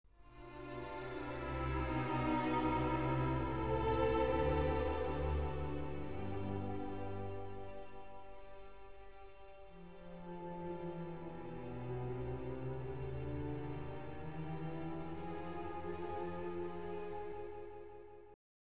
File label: classica